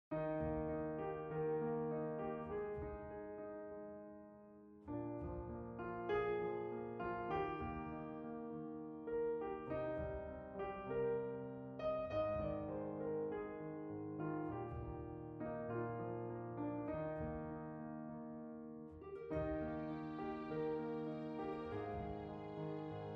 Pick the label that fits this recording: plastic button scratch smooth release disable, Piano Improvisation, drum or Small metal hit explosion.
Piano Improvisation